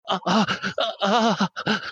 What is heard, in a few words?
Orgasm